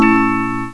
Beeps; Glider PRO

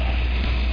Pencil; SketchBook 2.1

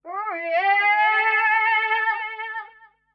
VOICE GRL, Voice; eJay

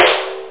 thins snare, perc

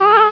Ohohohalf!; Bob's Eyes Pro

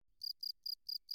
notify

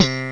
Slap Bass (C2); X-Wing Demo; X-Wing Patches

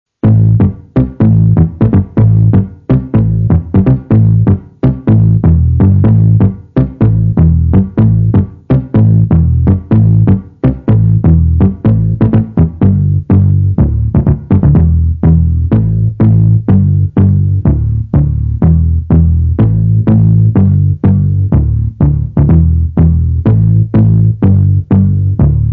bass eff; Mirex; Bass - upraven²; Zv²raznil som nφzke stredy a basy ekvalizΘrom.

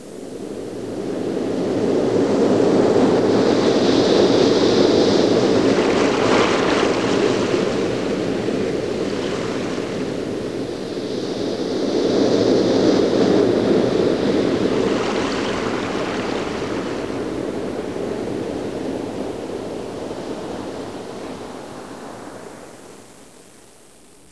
waves crashing; Sound Effects for Multimedia - Volume 1

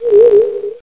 Alien; Soldiers 2

